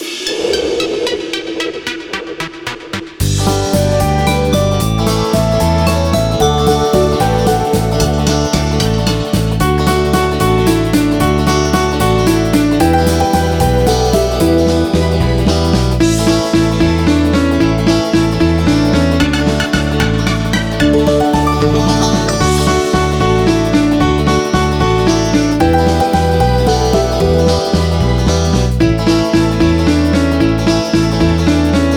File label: karaoke; novyi god